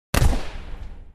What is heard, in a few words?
gun